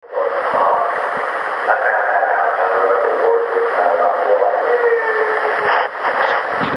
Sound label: female sigh hum; chip coffey